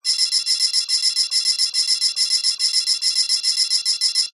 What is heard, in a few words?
science fiction machine data receiver receive signal message burst